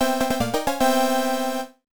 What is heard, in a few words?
Round Clear Music; Motos; x68000